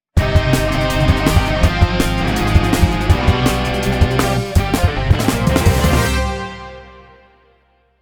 Transition

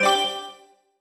Next Button

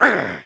Kill Hits; Kite

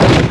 Menu hit

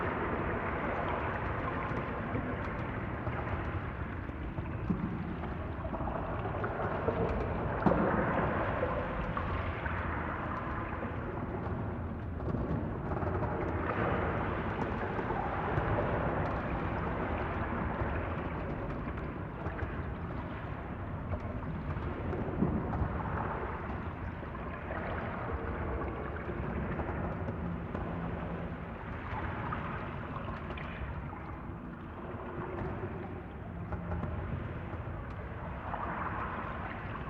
Salt House Inside ambient

